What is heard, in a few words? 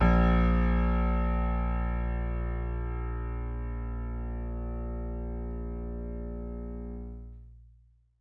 MIX L, Acoustic Piano; InVision Interactive; Miroslav Vitous